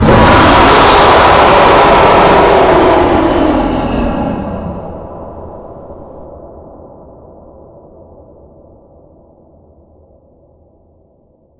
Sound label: screamer; phantom golden leopold